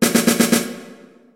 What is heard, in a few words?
Do rythm